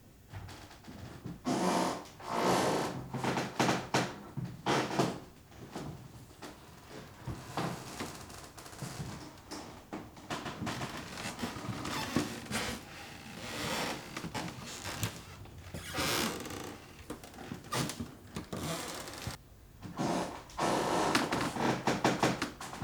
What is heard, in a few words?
Parquet crack